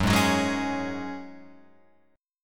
F sharp Suspended 2nd, Guitar Open F; strummed; JGuitar

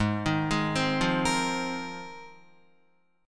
G sharp 6th Add 9th, Guitar; G#6add9; arpeggiated; 4,6,6,5,5,5

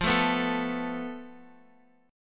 F Major Flat 5th, Guitar, Open F; strummed; x,x,5,4,2,4; JGuitar

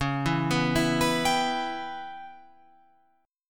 C sharp Minor 7th Flat 5th C sharp Guitar Open F; C#m7b5 arpeggiated; JGuitar

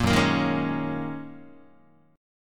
Gb 6th Add 9th Gb down Guitar; strummed; 4A,4E,5A,5D,5G#,6C#

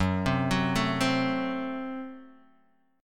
Gb Diminished, down, Guitar, Standard; arpeggiated; JGuitar

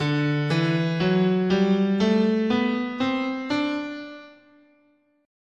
D Mixolydian, scale

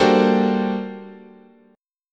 G Minor Major 11th, guitar chord

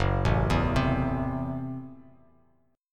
Gb Minor 7th Flat 5th, Bass Standard; arpeggiated; 2,0,2,5; JGuitar

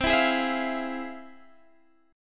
F Minor Major 7th C, guitar chord

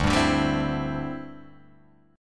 D 7th Suspended 2nd Suspended 4th, Guitar Open G

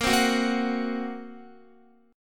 Bb Suspended 2nd Sharp 5th, Guitar; Bbsus2#5; strummed; x,13,10,11,10,13; JGuitar

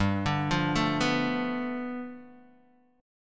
G 9th, Guitar DADGAD

